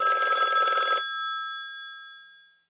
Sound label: Old Phone, Ring Tones; ShoreTel